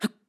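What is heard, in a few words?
Climb, voice; BOTW; Breath of the Wild; Link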